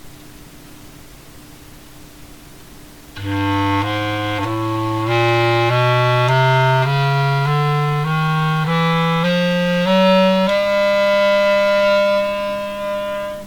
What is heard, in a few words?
Sharp A, clarinet